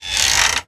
cable strain

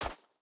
wolf footstep snow hard; Rust

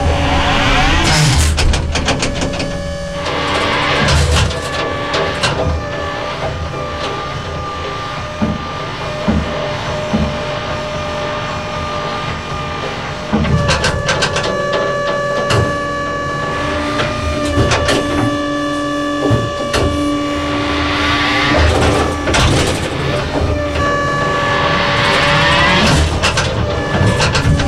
turret turn loop, tanks; War Thunder

